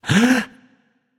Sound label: nv breath; smite; voicepack; ganesha